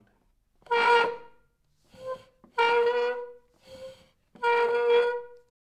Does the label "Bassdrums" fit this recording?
No